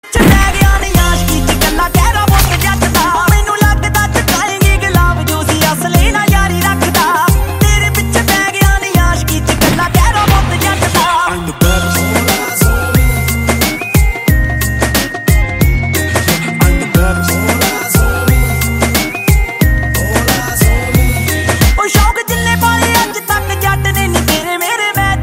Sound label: Glock